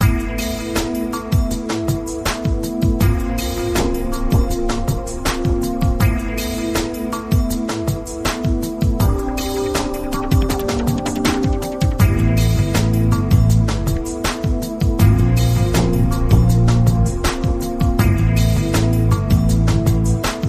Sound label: Smooth Groove